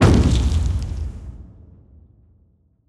harpoon Hit; Thalamus; CARRIER

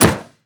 Hit Metal; Damage

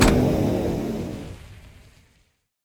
Reactor Temperature Boost Down

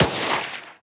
SMG single Shot; Weapons

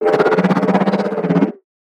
hammerhead idle

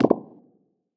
cell Death; Leucocyte; CARRIER

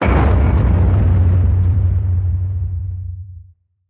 Structure Blunt; Damage

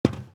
Footstep Metal; Footsteps

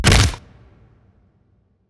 Hit Armor; Damage

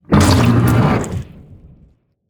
spineling giant attack projectile

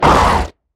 damage; Barotrauma; Crawlerbroodmother

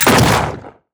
Shotgun Shot; Weapons

